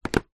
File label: cigarette packet down on table; foley